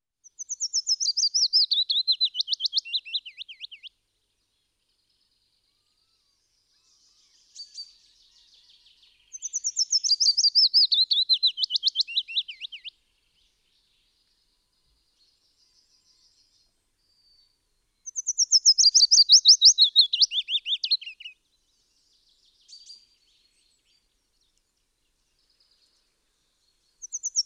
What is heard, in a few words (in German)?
Fitis Gesang, Wildtiere in Europa; voegel